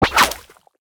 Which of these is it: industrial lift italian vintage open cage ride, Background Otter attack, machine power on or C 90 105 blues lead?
Background Otter attack